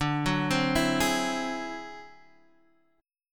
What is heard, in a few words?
D 9th Flat 5th D down Guitar DADGAD